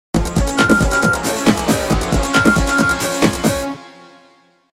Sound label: Music logo - Short dance groove beat w emergency feel; Hollywood Edge Sound Designers Tool Kit